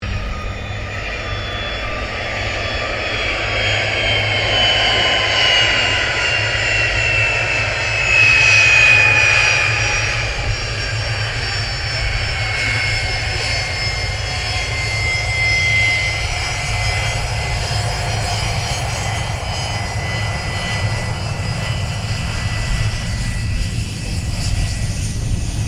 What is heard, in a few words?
Gulfstream G450 taxi, Corporate Jet; Airplanes (Sound Effects:Vehicles)